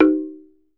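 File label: Tongue Drums, high; E-MU Producer Series Vol. 7 – Old World Instruments; Pitched Percussn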